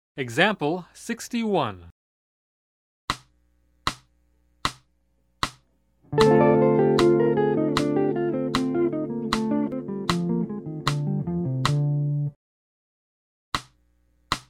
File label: Jazz; jue shi ji ta